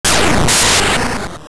Eye Blast; Vaati's shield of eyes firing a blast; Vaati Reborn

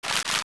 Turn; Skulltula; zelda; OOT

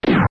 Cannon Ball; Cannon Ball fired; LTTP

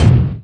Step Slow; Ganondorf walking on ordinary surfaces (slow and fast, 2 sounds each)

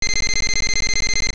Switch Hook Full; OOA; Zelda; Oracle

